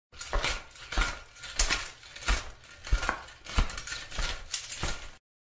Armor Hits

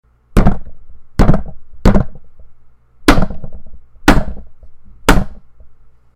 Hit the table

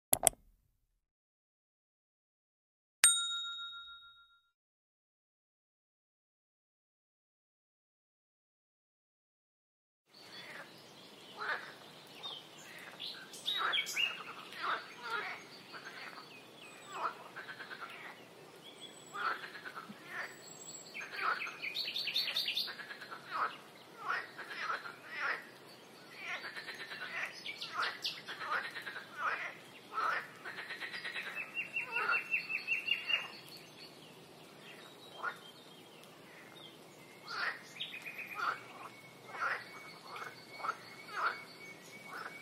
Marsh

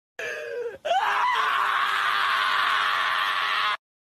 Anime screams; فریاد های انیمه ای

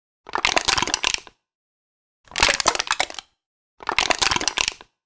Crushing a soda can; مچاله کردن قوطی نوشابه

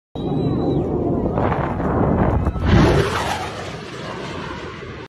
Breaking sound barrier; شکستن دیوار صوتی

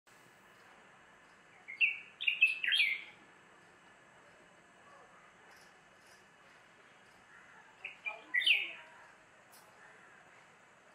common nightingale female; برای جلب جف; هزار دستان ماده

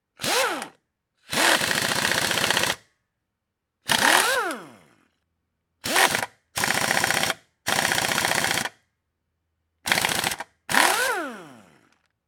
industrial mechanic using rattle

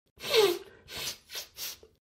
nose sniff

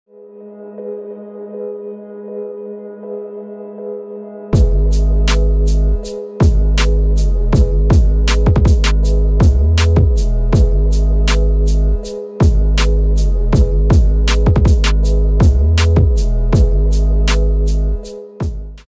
hip hop; موسیقی هیپ هاپ بی کلام

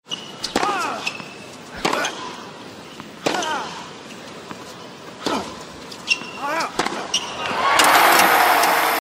tennis

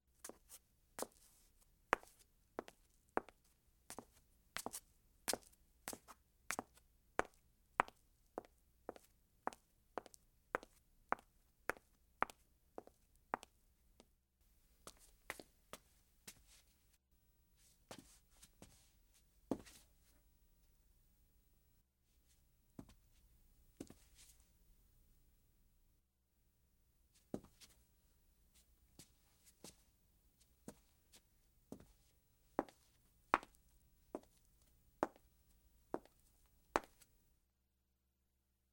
Sidewalk Sound Effects

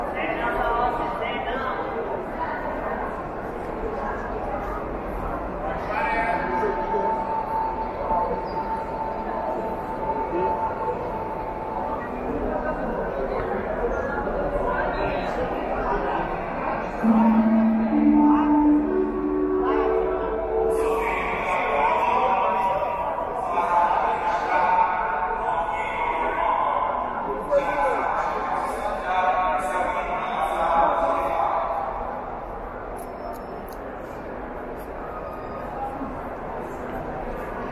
Bus and passenger; داد و بیداد موقع مسافرزدن

